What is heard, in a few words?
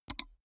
gaming mouse left click single fast soft